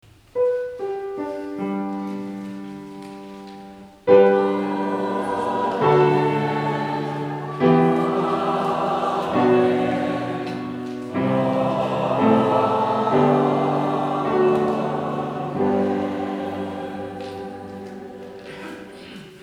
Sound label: Amen